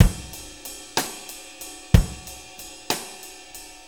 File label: 6-8 Loop, Full Kit Loops